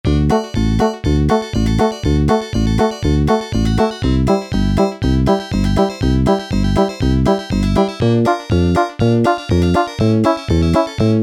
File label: BLUEGRASS, Yamaha SHS-10; Factory style demos